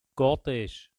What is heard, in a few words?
cortege